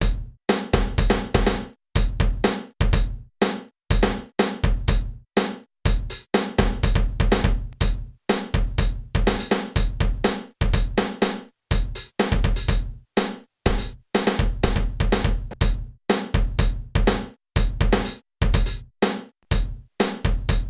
Vermona ReTubeVerb True Bypass vs. Effect Stop

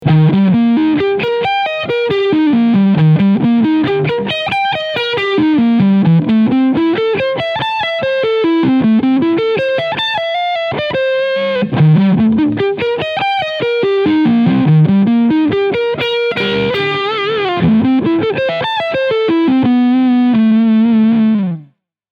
ARPEGGIOLINES

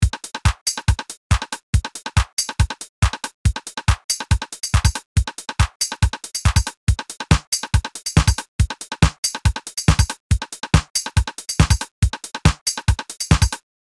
TR 707; Roland Cloud

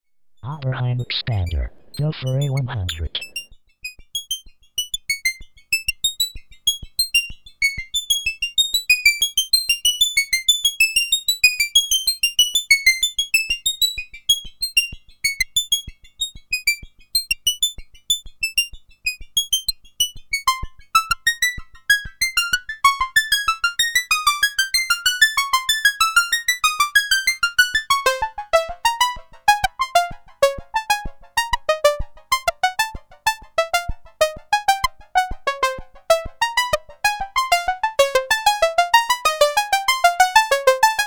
4 Pole Low Pass

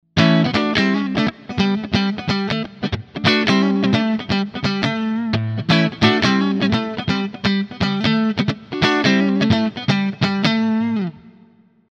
Framus Diablo Pro Neck split clean

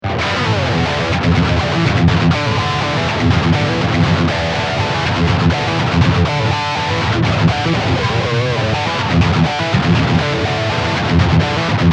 High Gain

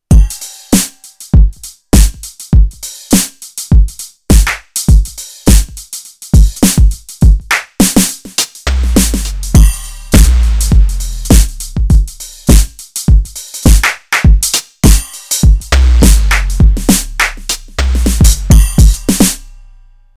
Roland TD-4KP

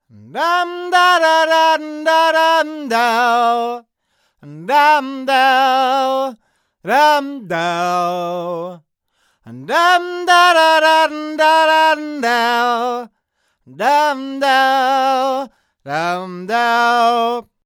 sE 4400a S2 far

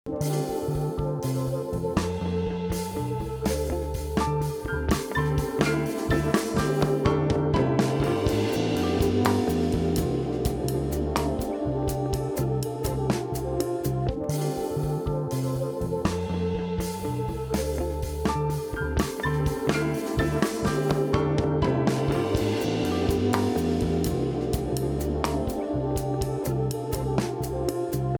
COMP R22 2 dB GR Soft Knee; Thrust C

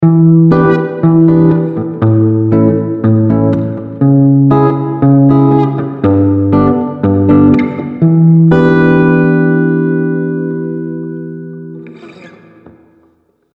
Behringer ACX450 Room

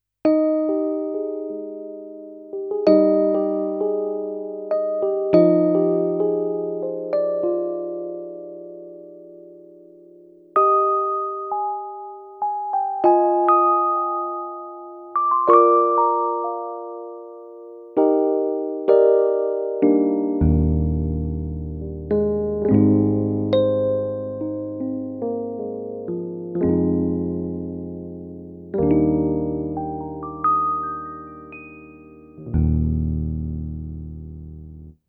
EPiano

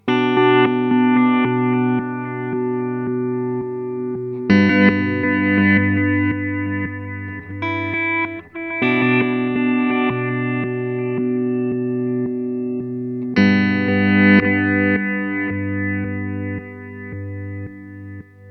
Chase Bliss Blooper Reverse Filter

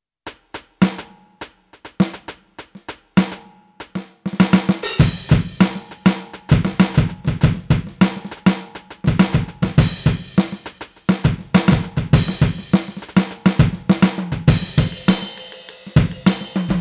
Mesh Kit FullSet; ALESIS Nitro

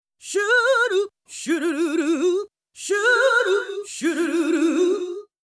DRP5 VOX Short Rev

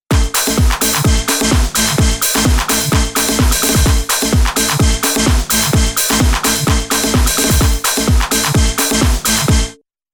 Zoom AR 48 Harddance

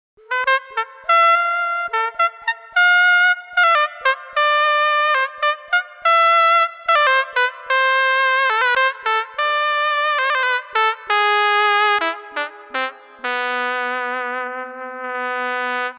Korg Prophecy VST S-Trumpet

